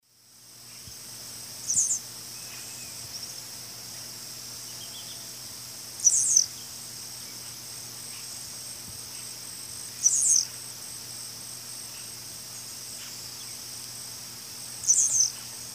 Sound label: Volatinia jacarina, song male; S. America; Eastern Lowlands